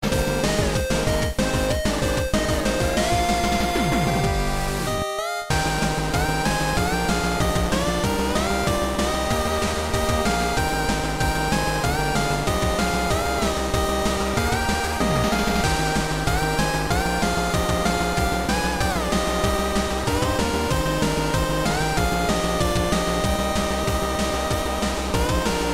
Polaris 8bit

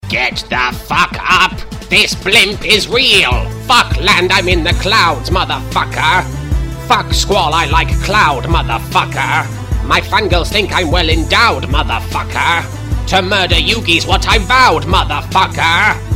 Anime Song

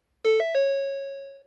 Tri-Tone Ford Chime

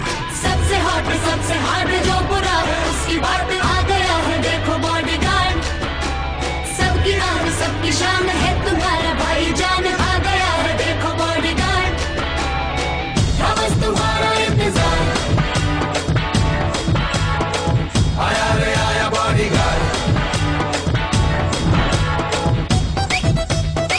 Female; Aaya Bodygurd

